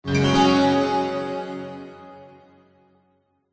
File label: multimedia game sound happy bright warm positive tone